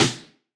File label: Snare